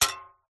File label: battery compartment spring ping; household